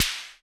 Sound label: Korg 01W MegaDrums; 300 Drum Machines